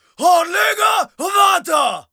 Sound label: Battle Cry, Viking Vocal, Un-Processed